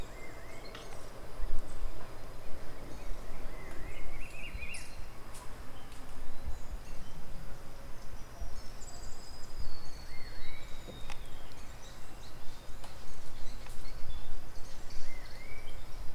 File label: Ambient Brazilian woods Birds On The Background